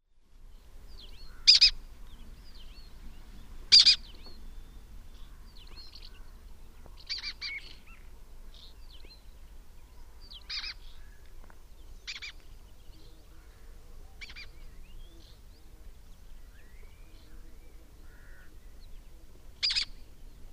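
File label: Lesser kestrel, bird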